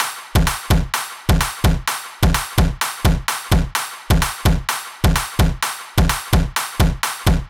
Bigroom F 128bpm Top; EDM Drums; Drum Kits; Stems; Ghosthack; Ultimate EDM Drums; BigRoom Kit 02

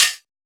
Open Hi Hat, Dubstep Cymbals; One-Shots; Drums; Ghosthack; Ghosthack - AC2024 - Day 13 - Dubstep Sounds 2025

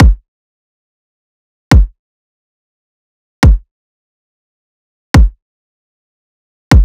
Riddim Drum Loop Kick (140)

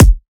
Riddim Kick

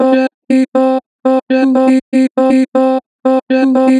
Chop - 2 bar vib, Natural Vibrato, Vocals; Harmor Vocals

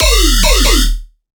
Riddim Bass Hit; Aye

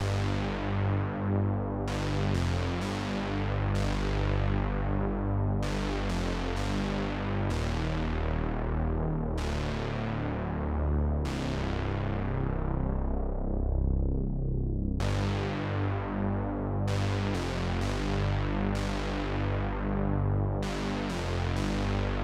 128 BPM G Min Bass, Analog; Melodies; Loop Stems; Cymatics; GHOST (Beta Pack); Archangel

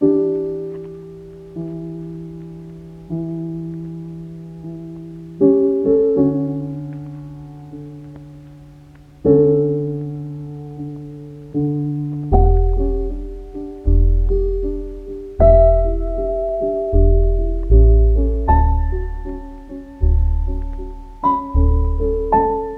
78 BPM D Maj, Melodies Lofi; Cymatics; ZODIAC Beta Pack

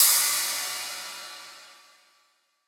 Ride; Hats and Cymbals

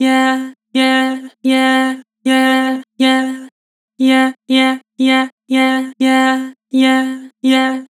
"Yeah", Vocals; Harmor

